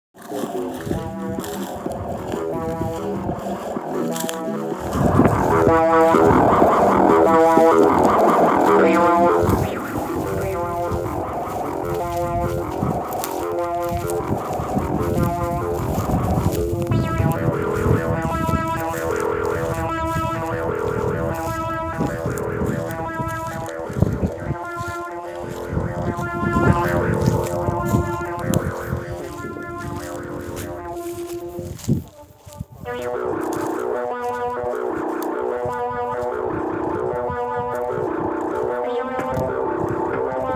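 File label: sint and beat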